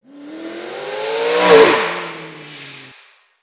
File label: Car One shot Pitch Up